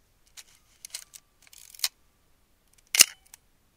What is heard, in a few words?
PENTAX MX